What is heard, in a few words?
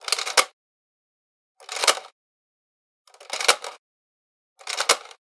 Generic Click Plastic Medium; boom library; The Interface